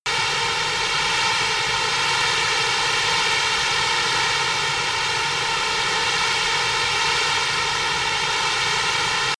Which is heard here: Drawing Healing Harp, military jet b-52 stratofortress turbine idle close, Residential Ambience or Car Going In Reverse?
military jet b-52 stratofortress turbine idle close